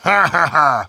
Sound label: laugh evil, Heavy